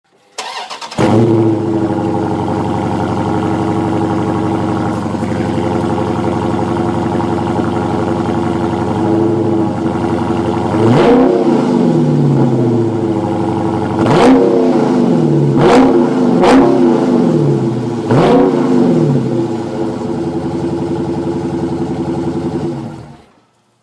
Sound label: Charger SRT Exhaust Engine Start